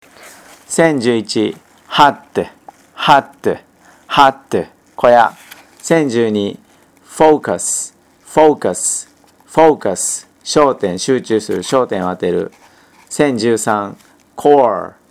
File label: tango